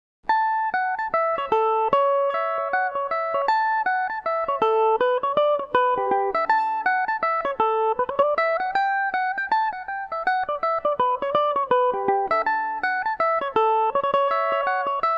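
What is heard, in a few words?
reel A mixolydian, irish mandolin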